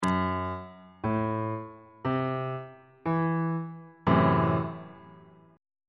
g double sharp minor 6th 3rd inversion on bass clef